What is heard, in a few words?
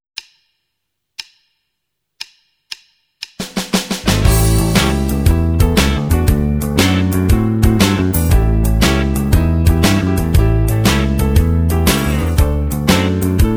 Fast Blues, In A; minus blues